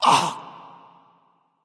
grunt hurt; smite; voicepack; hun batz

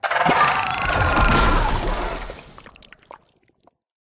scorpion death; smite; npc

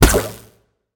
bounce; smite; god raijin

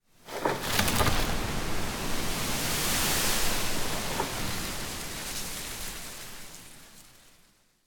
ambience foliage; smite

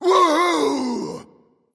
yay; smite; ravana

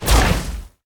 wall of spears spear attack fire; smite; odin

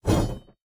whoosh; Smite; Sobek

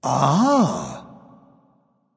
"ah", discover; smite; voicepack; ullr

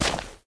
step; smite; chronos

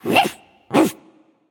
growls

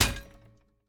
idle staff stab; smite; ahpuch skin10

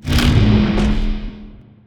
deactivate; smite; poseidon

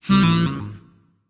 thinking; smite; voicepack; janus

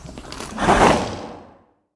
chariot horse breathe; smite; Apollo

